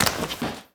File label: Bodyfall Tile; SFX Kit; Bodyfalls